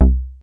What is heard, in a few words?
BASS BANK, L; Sound Gallery - Charged